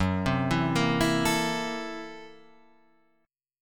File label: F sharp 7th Sharp 9th Flat 5th Guitar Half Step Down, chord